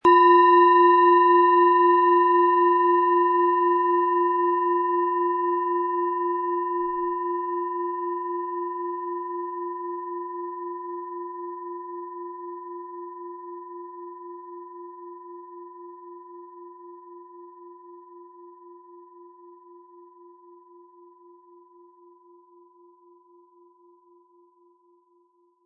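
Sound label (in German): Planetenschale inkl. Klöppel; Platonisches Jahr, Ø 12 cm, 180-260 Gramm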